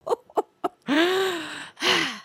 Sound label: laugh; emotes